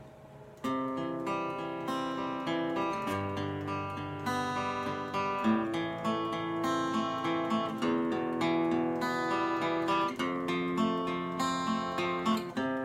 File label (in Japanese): アルペジオ